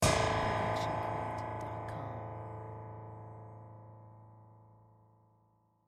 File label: metal piano hit